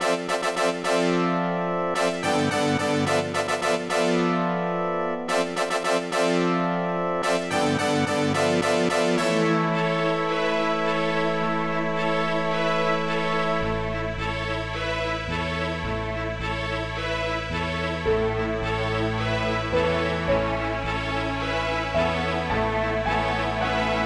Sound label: tune